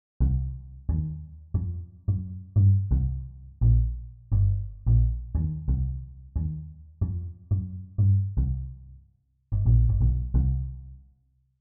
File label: Bass